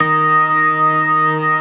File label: STOPS, Synth and Organ